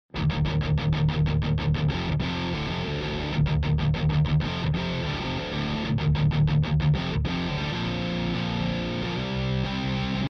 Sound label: test heavy, gitarrenaufnahme; Probleme bei Gitarrenaufnahme Phase